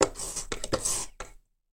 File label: hand soap